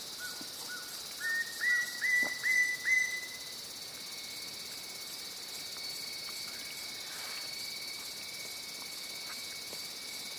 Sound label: Ringed woodpecker